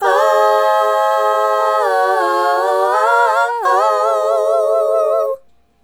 Female Group, Vocal

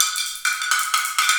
ROT DRMS, ROTATE DR; Spectrasonics; Heart of Africa 1

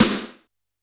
E2 - SD Rock H, Yamaha PSR-E323 Electronic Kit

